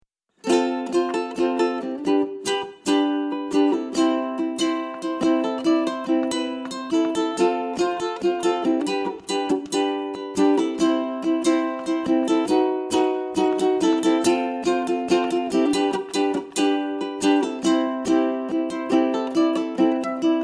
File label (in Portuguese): mais proximo de ti ukulélé